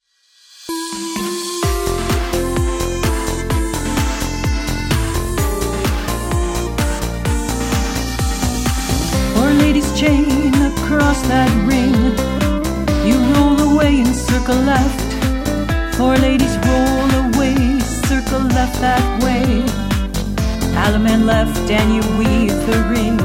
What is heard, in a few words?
vocal sample